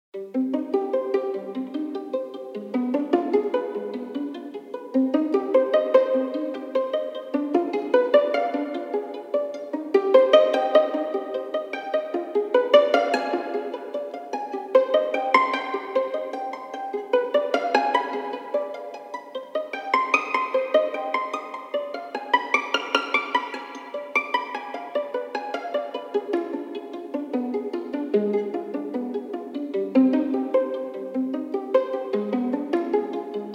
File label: classical violin pizzicato solo